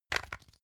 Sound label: pepper mill scrunch; soundpool